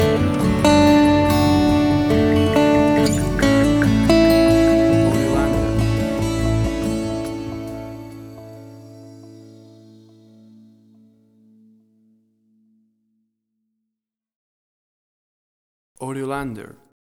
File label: Acoustic Guitar Moods Stinger